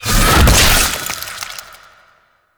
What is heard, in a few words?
Siphon Cast; Warframe; Garuda